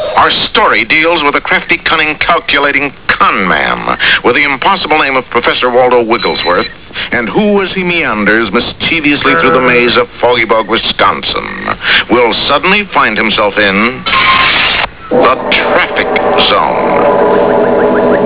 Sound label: traffic